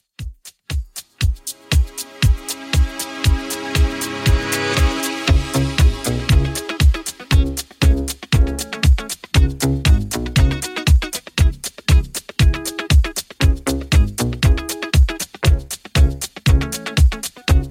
80s Redrum, 118 bpm

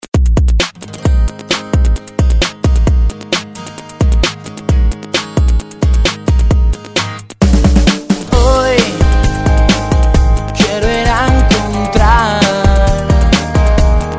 ReDrum, 132 Bpm; DJDOLLS

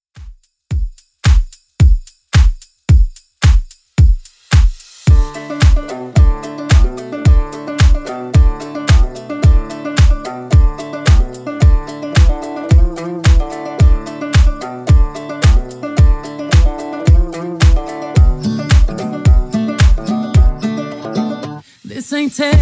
Top40 Redrum 110bpm

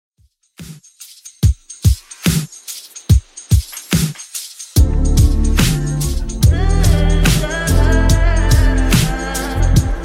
Top40 Redrum 72bpm

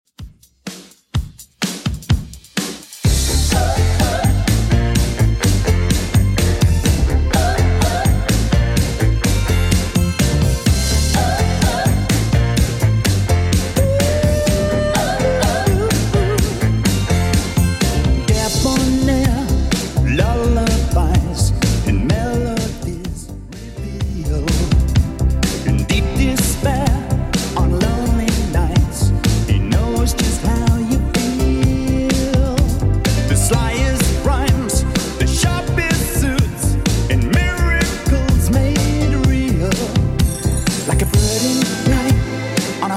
80s Redrum, 126 bpm

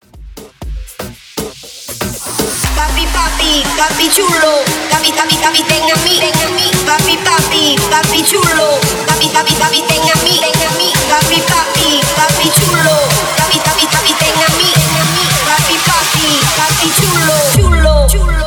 Moombah Bootleg Transition 128 108 BPM

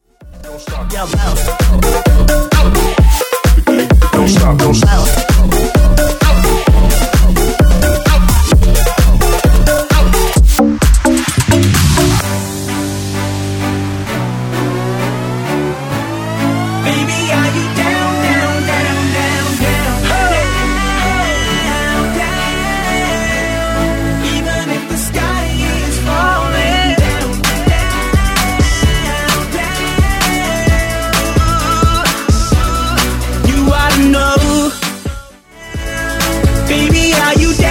EDM Banger

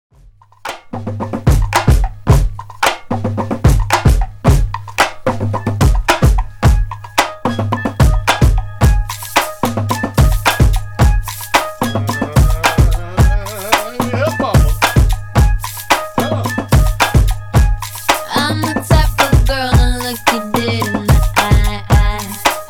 Re Drum 110Bpm

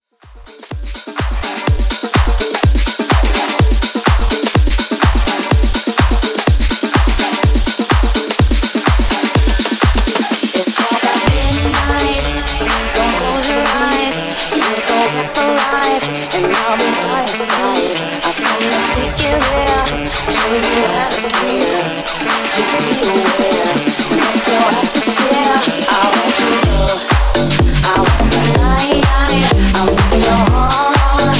Extended House Edit 125Bpm

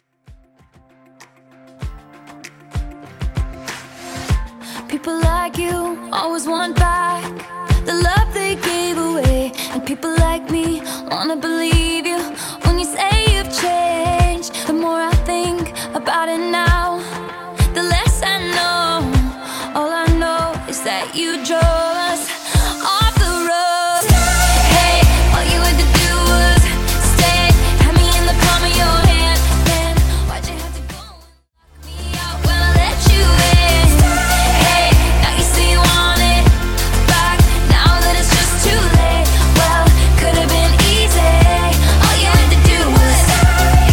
ReDrum, 97BPM

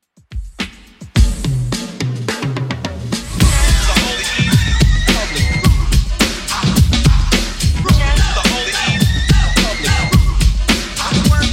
90s Old School Redrum 98bpm

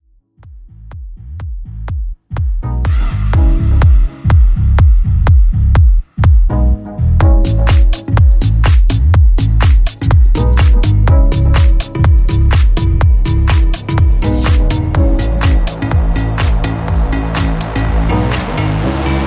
top40 slap house mashup

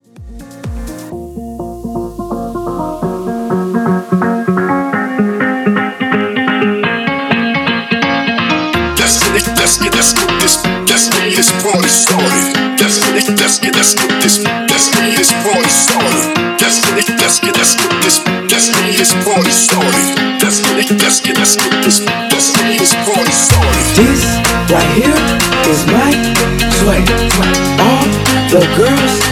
Mashup Party Hype Blend 126 BPM

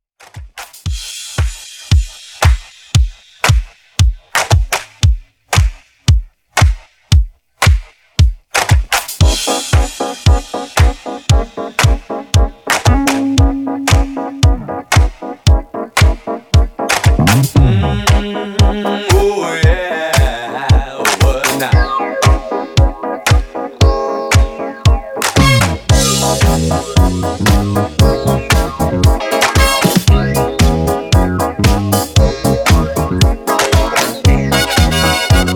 Re drum

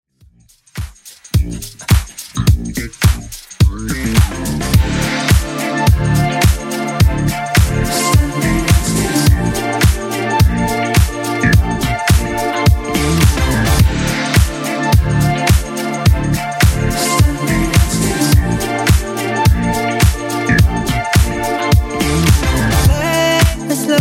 Top40 Redrum 106bpm

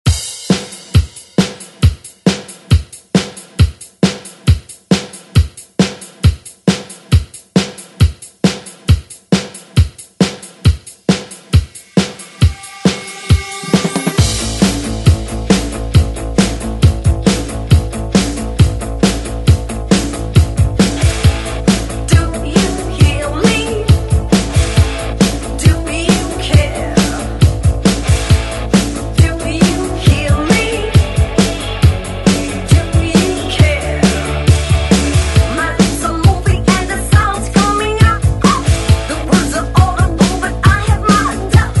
80s ReDrum; DJ Mhark

